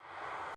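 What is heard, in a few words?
city night loop; The Sims 3; Windows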